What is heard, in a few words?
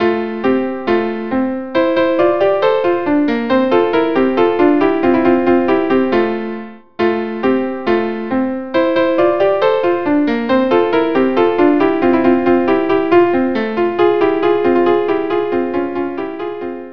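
violin viola; Al Ahat Kama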